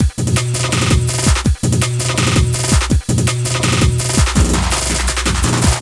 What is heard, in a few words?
165, drum loops, drum & bass; 90 s Sample CDs; Total Drum&Bass